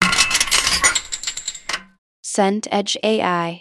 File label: weights machine stack